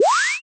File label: dash